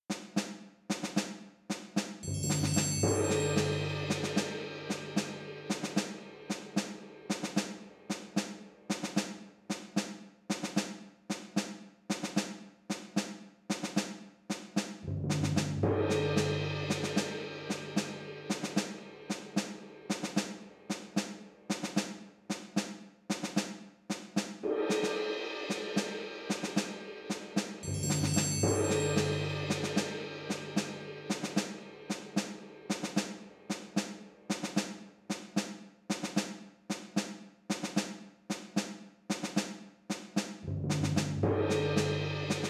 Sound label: Underwater; Super Mario Bros.